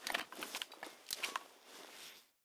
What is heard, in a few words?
foley, weapons, glock17; garrysmod